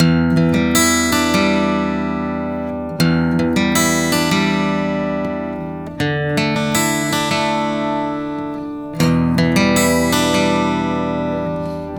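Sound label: Acoustic Arpeggio, Acoustic Guitar; Sonic Foundry (Sony Creative Software) - Continental Drift Aftershocks; Native American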